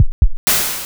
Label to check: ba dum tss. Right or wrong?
Right